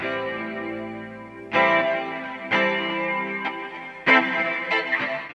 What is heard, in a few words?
GUIT; East West - Phat & Phunky